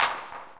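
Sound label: drum crap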